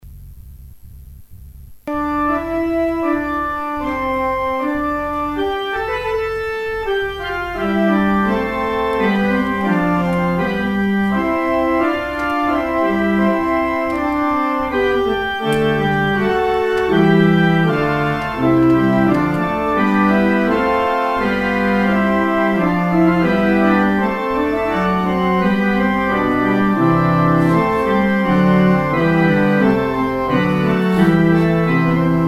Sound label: organ outro